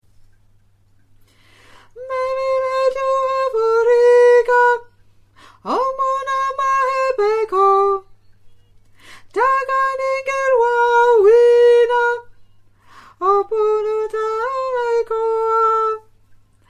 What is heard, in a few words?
Tenor